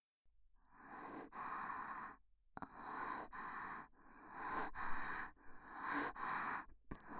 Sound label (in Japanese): オナニー 音声; 「精子醸造」にて使用